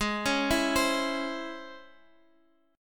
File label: C sharp Minor Major 7th, chord